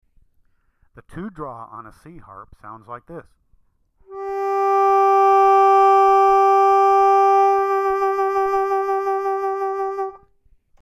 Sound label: Hole 2 Draw